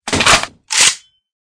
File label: clipin; cstrike; AWM MONSTER